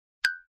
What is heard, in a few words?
blink sound effect